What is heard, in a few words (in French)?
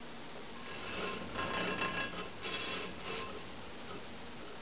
Machines Son